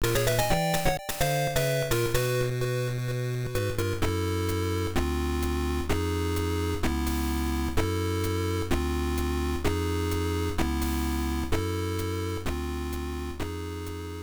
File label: Lose; Medarot